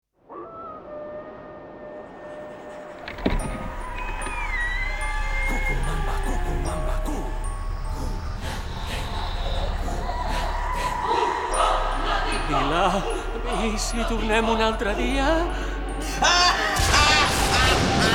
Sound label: musical